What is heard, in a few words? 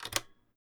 Lock